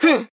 Grunt Attack

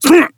Death; Owl; Paladins

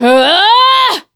Metal Warden Heavy Attack; battlerite

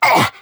Grunt Hit; Androxus; paladins

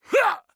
Grunt Jump; Warrior; Paladins

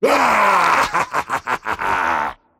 dismember laugh; Dota 2; pudge

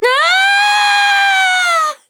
Falling; paladins; Flak

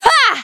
Basic Attack; Battlerite; Nomad

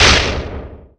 attack; Faceless Void

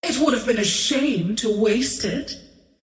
queen of pain scream good

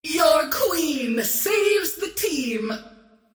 scream great; Dota 2; queenofpain

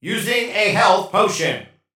Using Healing Potion; Warrior; Realm Royale

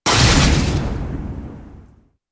Arc Lightning; Zeus

